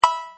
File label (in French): Bip progression